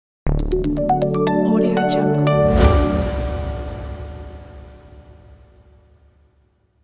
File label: minimal logo